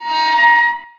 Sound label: Amb Constructions; Red Orchestra Ostfront 41-45